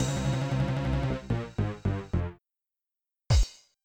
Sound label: gameover; Tokyo Wakusei Planetokio